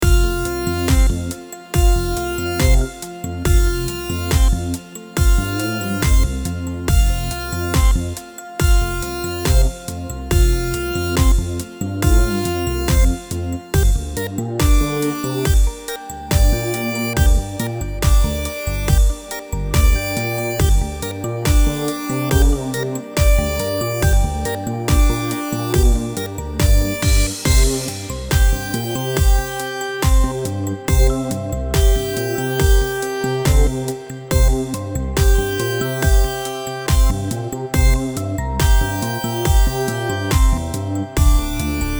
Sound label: Funk Dr